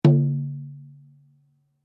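Drum Flat Skin mezzo forte, Drum heads isolated from drum shell; Musical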